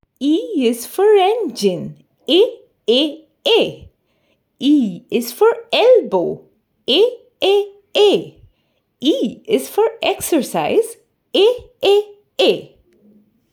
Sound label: Sing the poem and learn the sound (Ee)